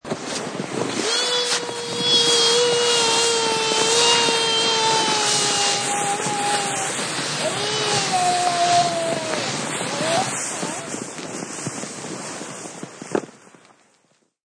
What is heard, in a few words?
Child sledding down hill and yelling joyously; Human